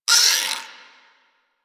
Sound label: Exterior Forest Critter; Warframe; Ambience; Duviri